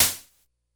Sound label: Snare, Korg DS-10